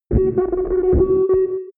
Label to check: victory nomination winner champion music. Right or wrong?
Wrong